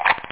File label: snap; da capo vol1; drums